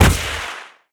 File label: shot bazuka; Furcherro